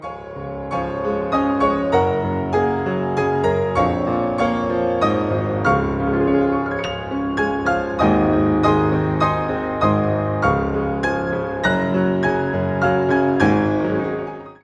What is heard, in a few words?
Rustle in Spring with Be Thou My Vision